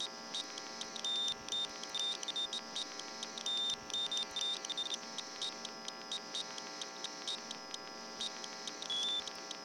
spaceship terminal idle

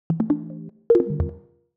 menu sfx

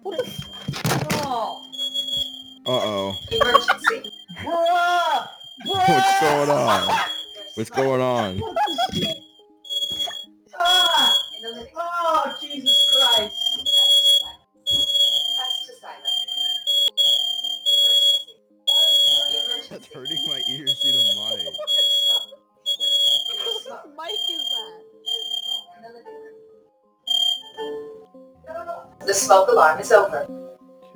the smoke alarm

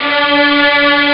strings

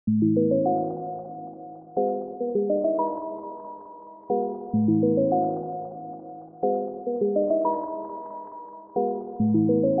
chords

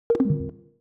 close men

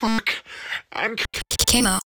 eletric toothbrush ahh

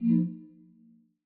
ui Return

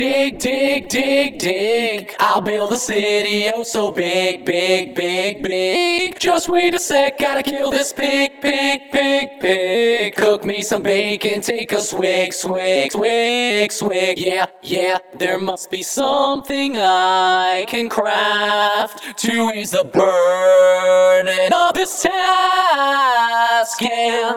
Vocals

